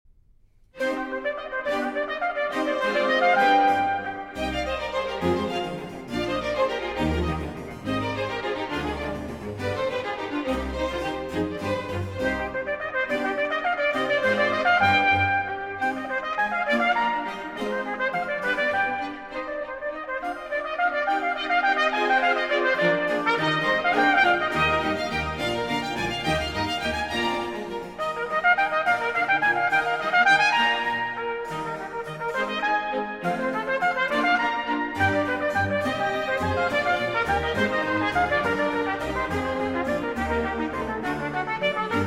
trumpet concerto; Marcello, A. Oboe Concerto in D Minor III. Allegro (Arr. for Trumpet in C Minor)

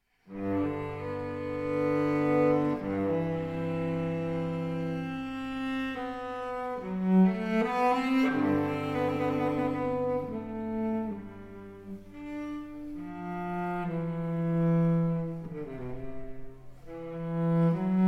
Cello Suite No. 1 in G Major, Sarabande; BWV 1007

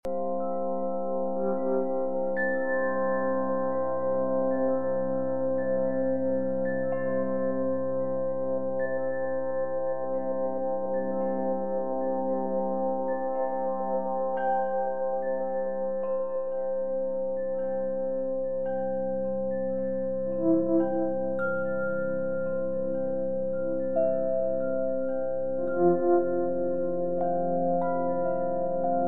Celtic Chimes 528Hz; Loopable

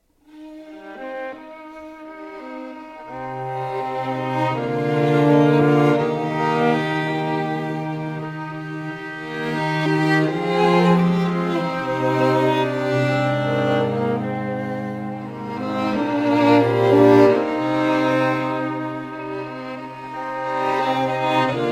(String Quartet Version)

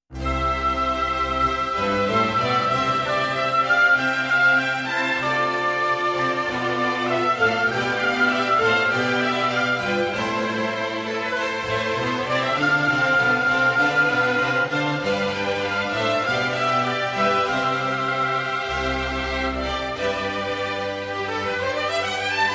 Orchestral Suite in C major, Overture; No. 1; BWV 1066; Grave-Allegro

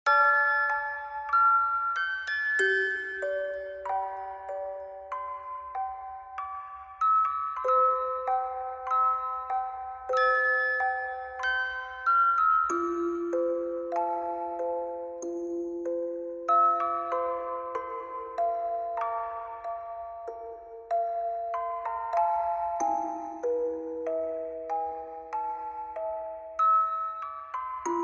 Sad Music Box

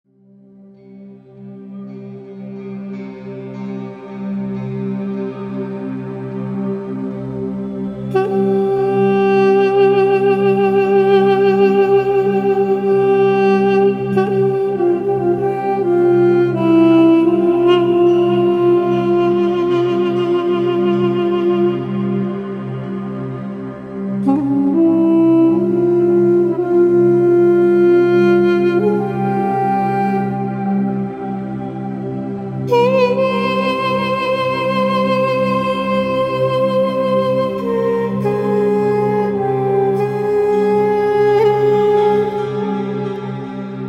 Persian Flute

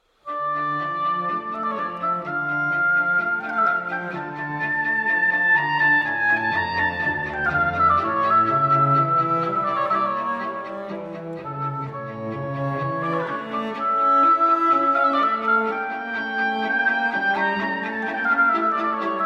Oboe Quartets; No. 2a, Allegro ma non troppo con expressione

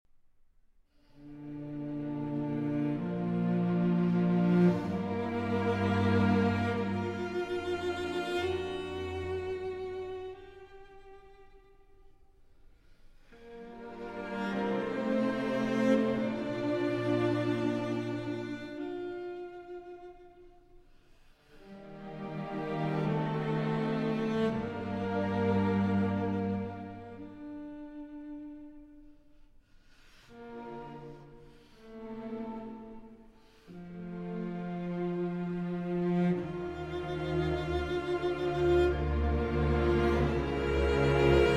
Cello Concerto in E Minor, Adagio; Elgar; Op. 85 - 3.

